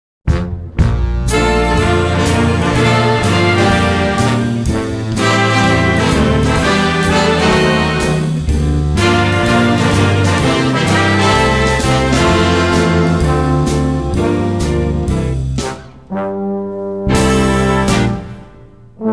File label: jazz